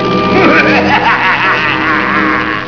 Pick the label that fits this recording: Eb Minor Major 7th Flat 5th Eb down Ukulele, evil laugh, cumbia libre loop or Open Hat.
evil laugh